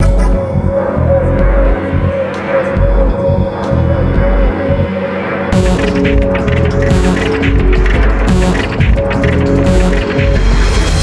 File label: BGM; Luxembourg Zwee